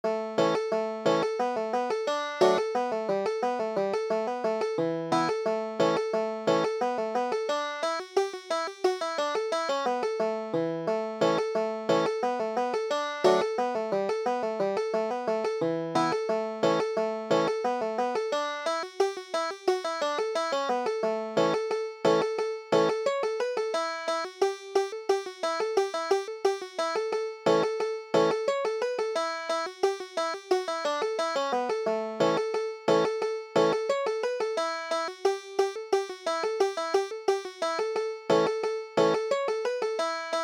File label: salt creek, banjo